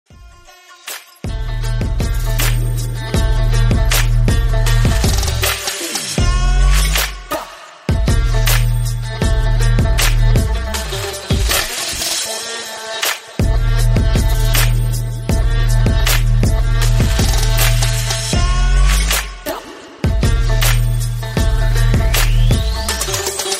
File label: Banjo Country